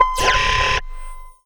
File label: Sound FX Space Ship